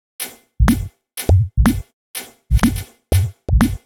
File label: Top D# 123bpm, House Drumloops; Zero-G House Fabrik Drumloops